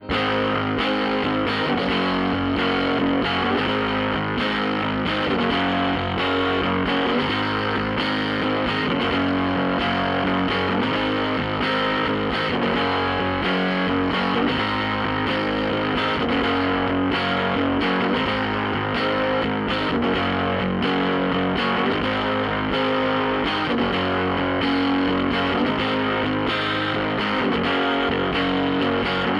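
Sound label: mic compare amp R92, distorted electric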